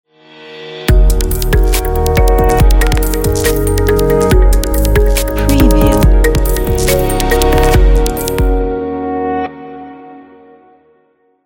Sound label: digital tech logo